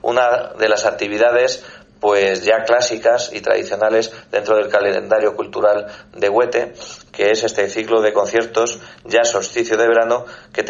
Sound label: jazz